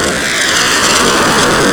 plasma loop

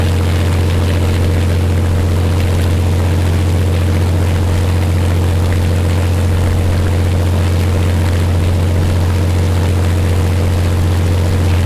rpm, bf109; lfs

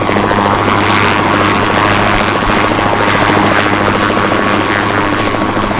littlebird loop; killstreak misc

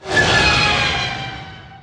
brake; lfs; naboo n1 starfighter

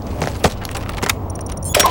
missile deploy; killstreak rewards; predator

